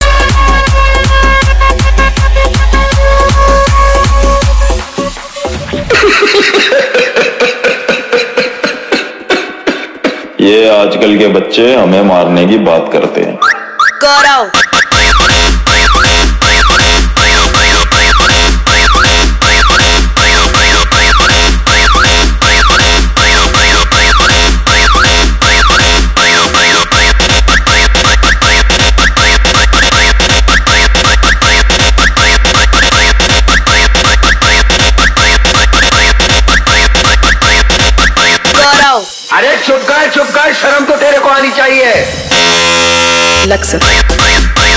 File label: Power Horn Trance Beat Hard Vibration; Power Horn Compition Trance Beat Hard Vibration Dailouge Mix